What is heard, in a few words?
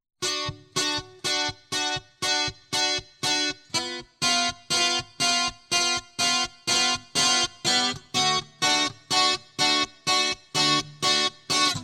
Getting Heavy Guitar Loop